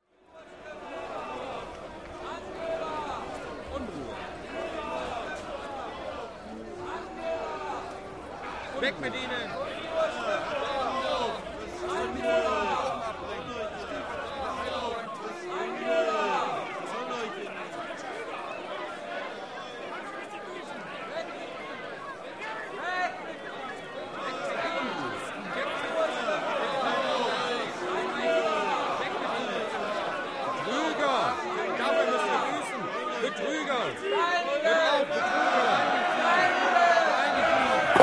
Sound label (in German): Geraeusch- Stadt Lystra mit Menschen und Zwischenrufen